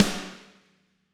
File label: Snare Hard; apulSoft apTrigga2; Steven Slate Drums